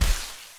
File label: MOVE; C64 Dreams; Multi-Sound Default